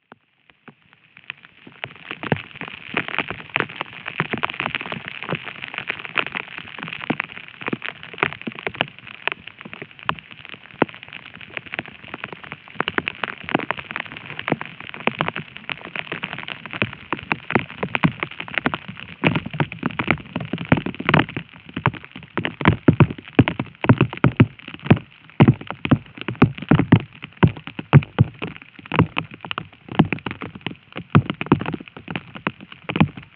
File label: raindrops contact mic longer recording mono